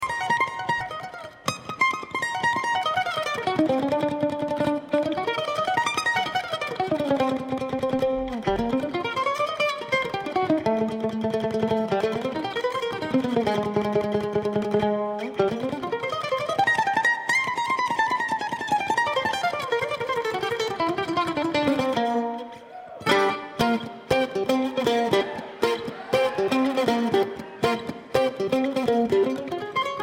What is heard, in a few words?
Kentucky Mandolin